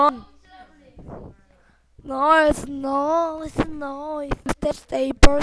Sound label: noise